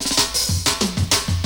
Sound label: FILL; 90 sSampleCDs; Crypton - DrumBase5000; GARAGE STACK